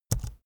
keyboard laptop enter press fast soft; kommunikation; computer

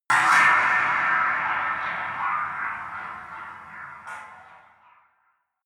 scrape metal hit; whooshes rises; hits impacts

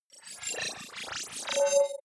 wobble hover swell long; energie

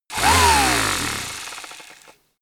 power tool short; soundpool

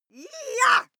female fight attack shout; schreie; menschen

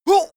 male hit; menschen; schreie

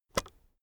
keyboard external space release soft, computer; kommunikation

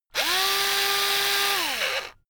electric drill screw in long; maschinen; bohrer; soundpool

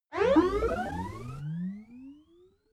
notification message take off; kommunikation; signale

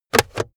car backseat table pull in cupholder quick hard

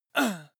male groan pain short; menschen; schreie

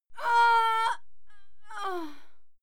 female die moan heavy

